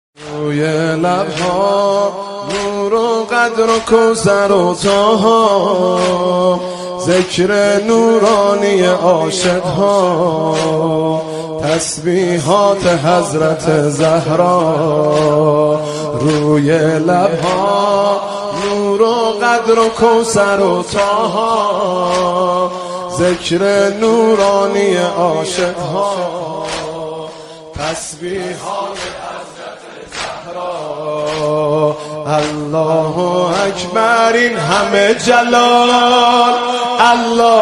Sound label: Mobile ringtone